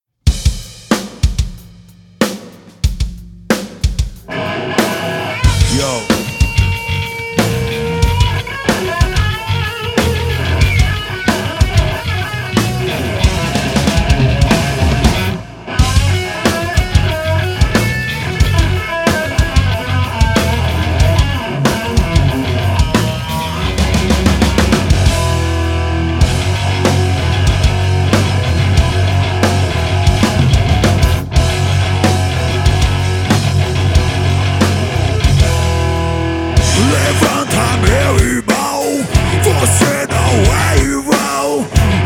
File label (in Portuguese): ao vivo no estudio